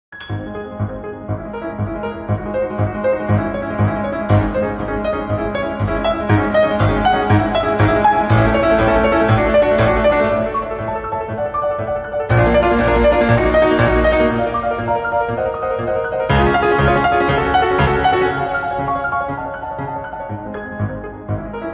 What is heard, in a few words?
piano challenge; peter bence